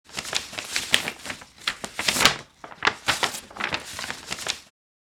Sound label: Paper sheet movements turning pages